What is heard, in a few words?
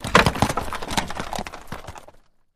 Carriage Jolts